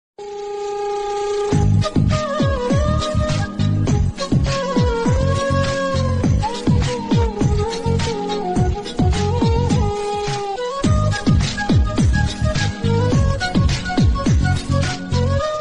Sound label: love bgm ringtone love bgm; kushi